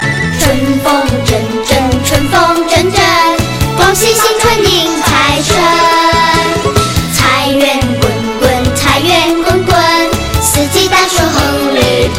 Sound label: chinese kids song